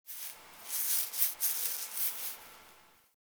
Foley Interact Scratch Lie Alert Right; Enemies; Warframe; Catbrow

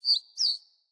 Bird Double Chirp; Galene Bird Guide

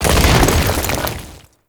Condemn Earth Crack; Warframe; Priest

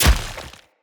Husk Impact Flesh, Unarmed; Weapon Impacts; Enemy; Mockery Husk

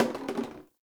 Janitor Cart Plastic Bottle Drop; Warframe; Ambience; NineteenNinetyNine

